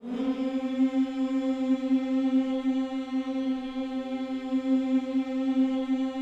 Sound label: HMMS C 4, Gospel; Spectrasonics; Vocal Planet Vol.1 Gospel; akai